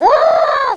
Extra Weapon; Swoop 1.0.0

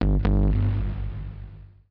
BASS SHOT HOUSE 125 A; eJay; Singleshots

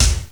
BD Power, drums; GMPlay; TG300B

